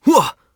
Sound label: "woah!"; sonic; vc